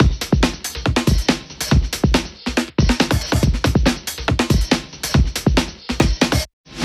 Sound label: Break Beats, 140 BPM; Loops 140 BPM; Vengeance - Essential Clubsounds Vol.4